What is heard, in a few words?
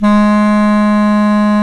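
bass clarinet, Orchestral Winds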